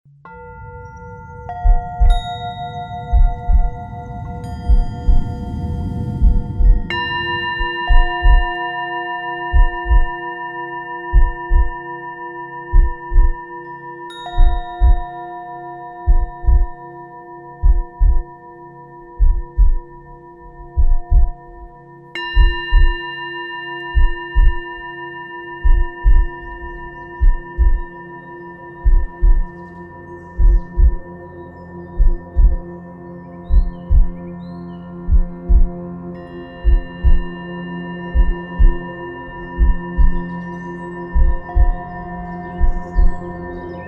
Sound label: Heart Chakra Sound Healing